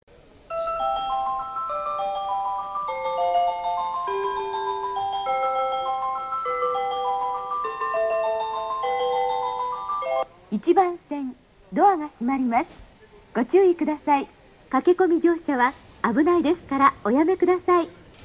melody

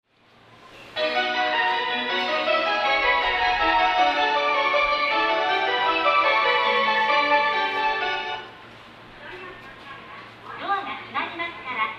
melody; takasaki; ageo; jreast

